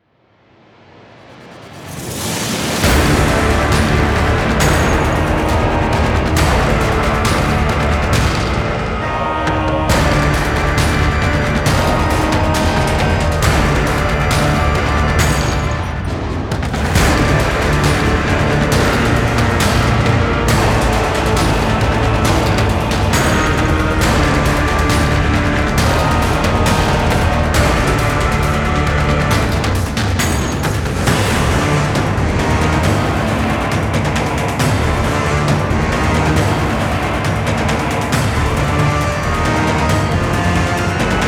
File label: Combat; Warhammer 40,000 Space Marine 2